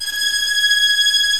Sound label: TREM VIOLINS